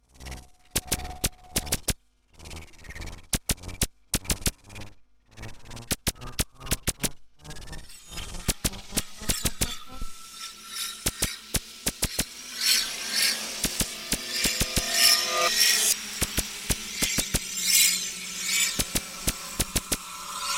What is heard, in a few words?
Rua da Alfandega, Lisbon; Fieldrecordings